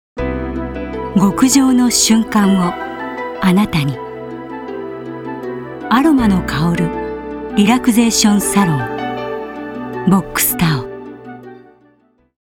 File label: Relaxation salon